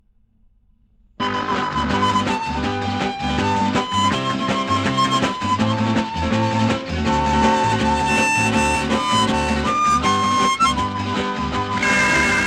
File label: Child Theme Music; Habari